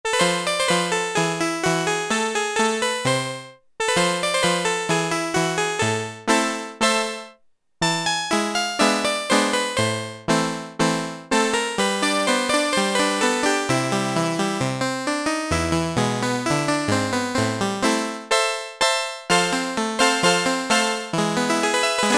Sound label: Harpsicord; Factory Presets Bank B